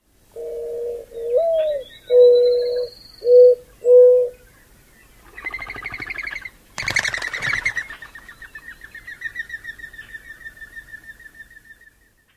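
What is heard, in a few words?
coo and flight twitter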